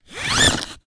animals dog leash lead extendable retractable short pull